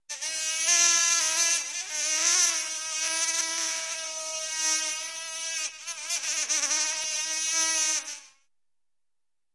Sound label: Medium Insect Buzz; insects